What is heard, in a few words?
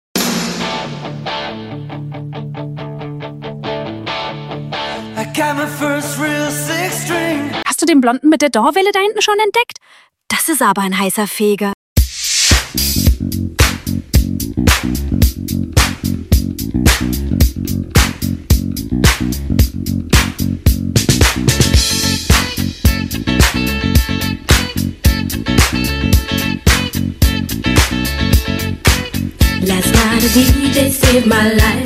Disco